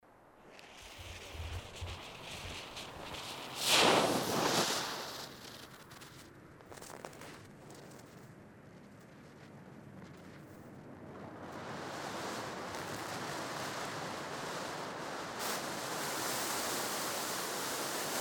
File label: fireworks smokebomb